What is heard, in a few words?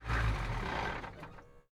vehicle semitruck turnoff